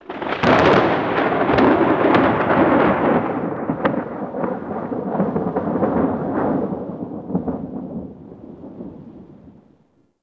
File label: thunder crack; Warframe; Tau